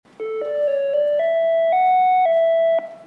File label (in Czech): znělka; Púchov